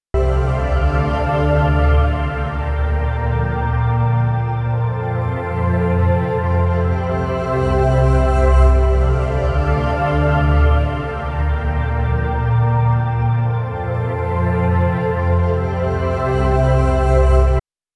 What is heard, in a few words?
Pad Dry